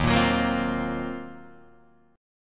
D sharp Minor 6th Add 9th, down Guitar DADGAD

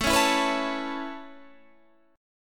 A Major Add 9th A Guitar Standard, chord; Aadd9 strummed; JGuitar

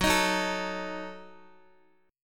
F sharp Minor 6th, Guitar; F#m6 strummed; x,x,6,8,6,5

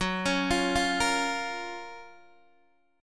Gb Major 7th, down, Guitar, Open D

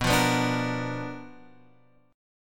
B Minor Major 7th Flat 5th Guitar Open F; strummed; JGuitar

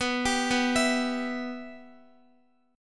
B Suspended 4th Sharp 5th B Guitar; Bsus4#5; arpeggiated; x,x,9,12,11,11

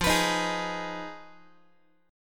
F Minor 7th Flat 5th, Guitar; strummed; JGuitar

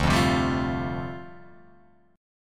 D Minor 11th D down Guitar Open F, Dm11 chord; Dm11 strummed; JGuitar

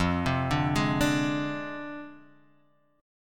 Ab 6th Flat 5th F

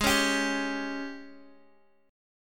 Ab Major 7th Suspended 4th Ab Guitar DADGAD; x,11,11,12,10,x; down

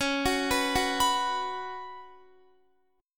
Db 7th Suspended 4th Db down Guitar; arpeggiated

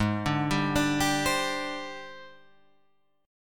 G sharp Major 7th Flat 5th Guitar Drop D

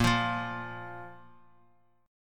A sharp 5th A sharp Guitar; strummed; JGuitar

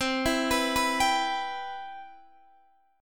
C Major 7th, Guitar Open D

